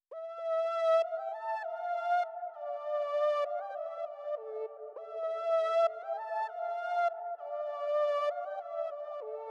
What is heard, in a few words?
West Coast Melody Loop - 99 BPM A Min Lead; Melodies; Loop Stems; Cymatics; Oracle Sample Pack